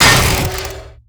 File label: ice attack; Deerclops